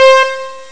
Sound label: ensemble; Amiga Soundtracker Sample Packs